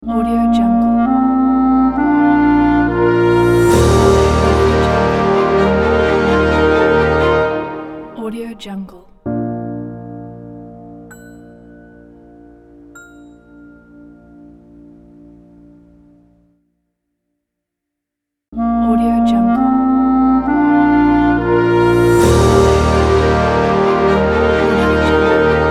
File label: cinematic logo